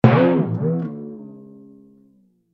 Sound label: wobble; Oddbods - Samebods